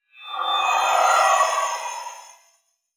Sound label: Teleporter Abduction Success; Evolve; Wraith